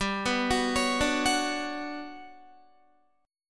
G Major Sharp 11th, Guitar; Listen to GM#11 arpeggiated; GM#11 Chord

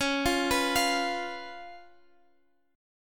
Db 7th, Guitar Standard; arpeggiated; x,x,11,10,12,13; JGuitar

